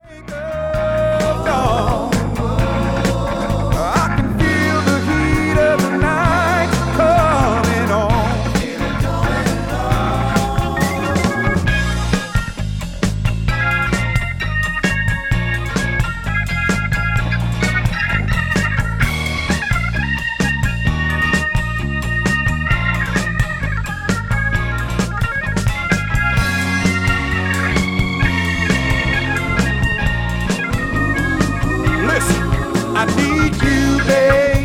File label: rhythm